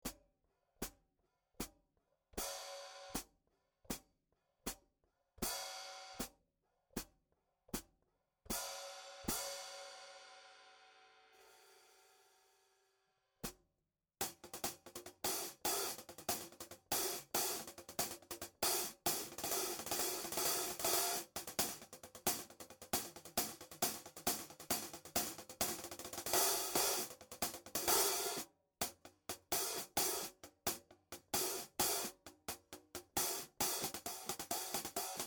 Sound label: 14" 1600 Era Hi-Hat, Bosphorus; cymbal; 834/944g